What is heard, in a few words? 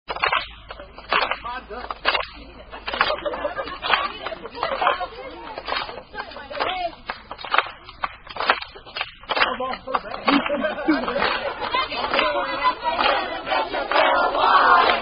Camp Songs; Humpty Dump